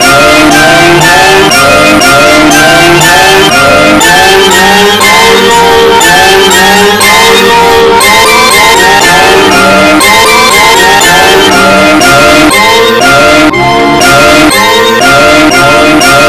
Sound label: jingle bells black midi